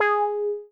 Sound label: meow G sharp